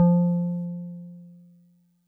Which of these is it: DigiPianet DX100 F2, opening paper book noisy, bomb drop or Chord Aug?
DigiPianet DX100 F2